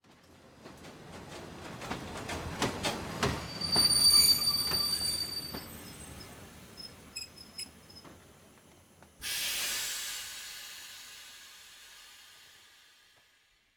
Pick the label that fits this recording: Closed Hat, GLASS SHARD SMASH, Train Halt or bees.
Train Halt